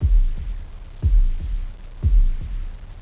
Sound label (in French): coeur